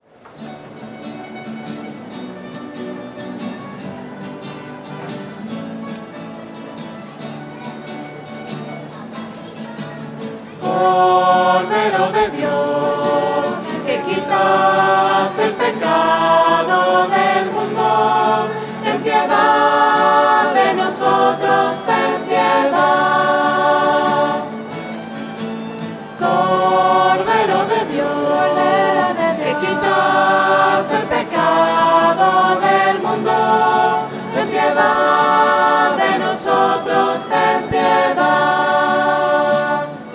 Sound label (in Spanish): voces; misas 2012